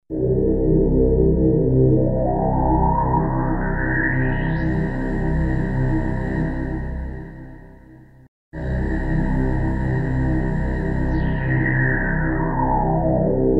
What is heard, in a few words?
dark voice, Yamaha CS1x CS2x